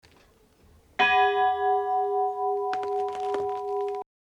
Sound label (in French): cloche; Trazits